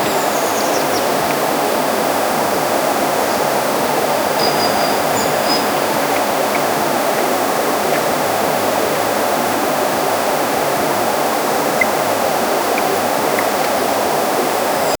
birdsong

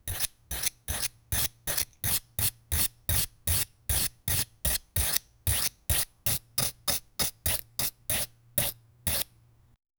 cutting sand with a knife; tool

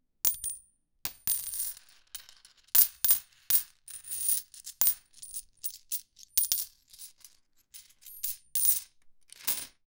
coin collection coin bag; collecting

